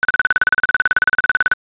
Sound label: fishing reel